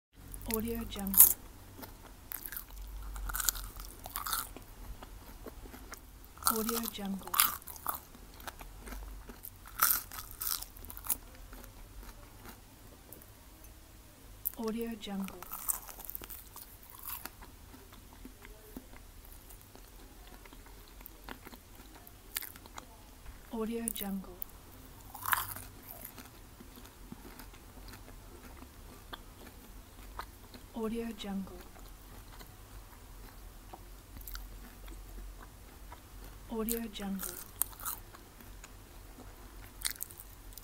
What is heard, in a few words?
female eating potato chips